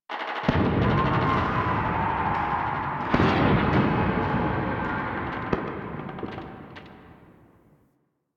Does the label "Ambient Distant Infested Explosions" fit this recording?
Yes